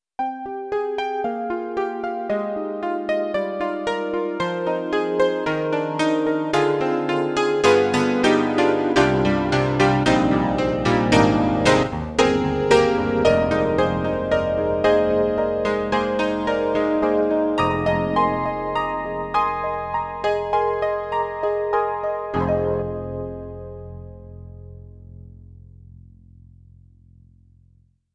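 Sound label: Dance Piano; Roland; SonicCell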